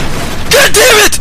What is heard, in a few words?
screams; penumbra black plague